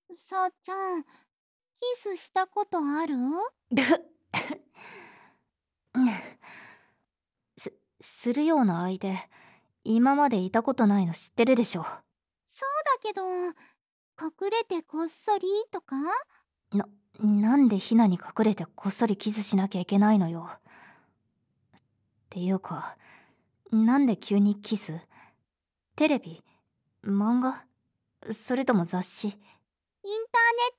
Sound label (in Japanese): キス, ASMR